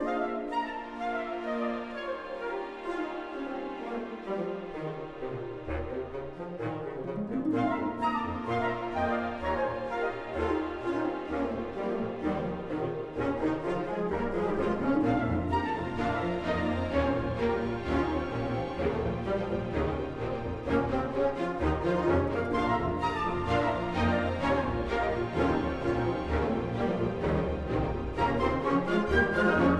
Comical 64bpm Loop; asterix obelix slap them all 2; Music Dialogue

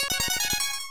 Clear Point; Monkey King Master of the Clouds

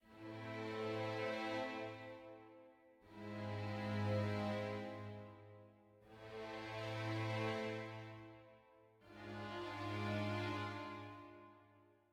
sad med start; Quivr; PS4 Windows gamerip 2016

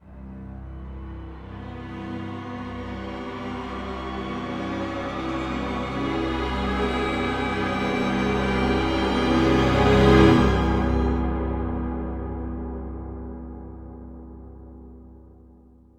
Jingl; Shiju Hachi; PS2

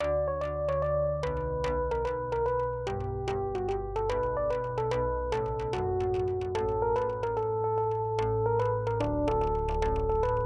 Dialogue Music; friday night funkin vs. umbal family computer; Week Umm & Fish

